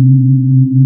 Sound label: C3, Synth Line; 90 s Sample CDs; NiceBeats; SynthLine VOL-2